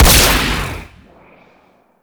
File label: Amplifier Barrel Fire; Weapons; Operators Amp Barrel Part B Fire C; Warframe; Amplifiers